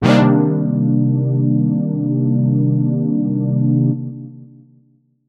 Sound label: Chord, dark, Chorus; EQed